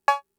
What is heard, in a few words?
Cowbell; Fricke MFB-522